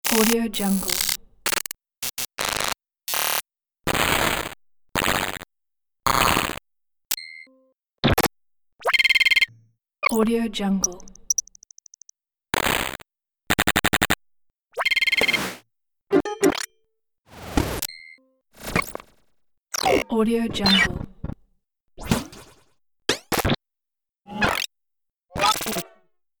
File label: glitch